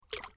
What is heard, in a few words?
splash